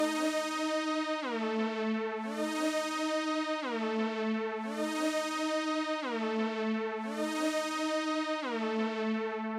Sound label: ENEMY DANGER SYNTH; Vostok Inc.